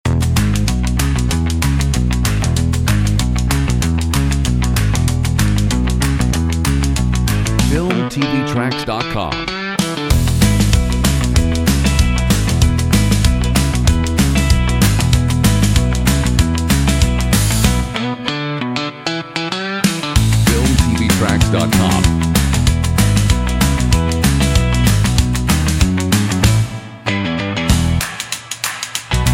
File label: POP ROCK PARTY; comedy funny